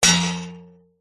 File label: warfare sword strike metal could be shield vibration; zapsplat